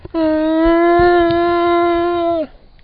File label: bear death; wizardwars